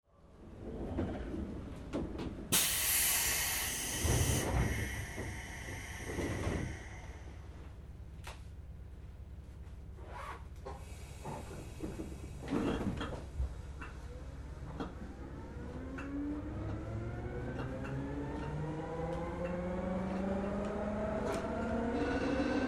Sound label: Seibu 101N; 録音車両：モハ３１３; 西武新宿→高田馬場; 高田馬場→田無; 田無→東村山; 東村山→所沢; 所沢→新所沢; 新所沢→狭山市; 狭山市→本川越